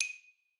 xylo

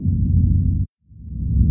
heart

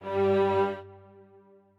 strings

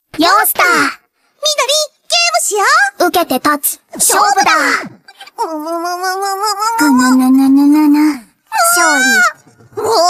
Vocals; 蔚蓝档案; ブルアカ; モモイ&ミドリ PV

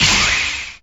SE GAME BREAK; maimai

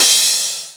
Crash

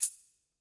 orch tamb

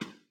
breakbeat

